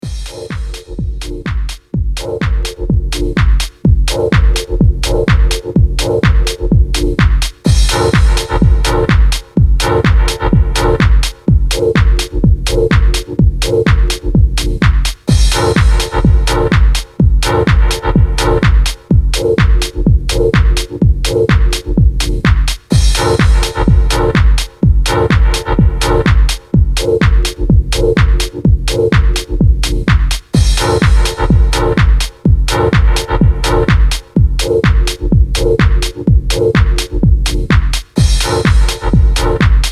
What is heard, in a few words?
Synth